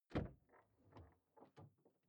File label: car door open exterior hummer h3